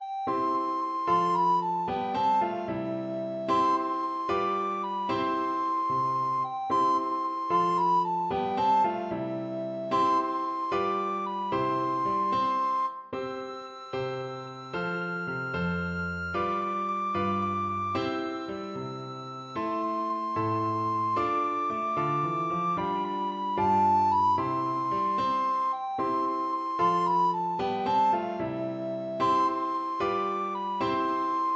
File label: vive la compagnie, recorder